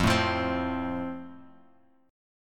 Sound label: F Major, Guitar Open F; strummed